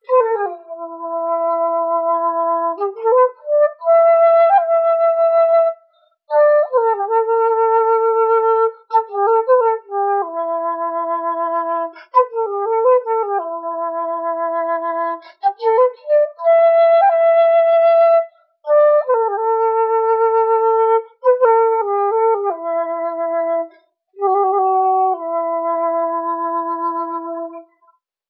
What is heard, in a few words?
flute, Jazzmatazz loops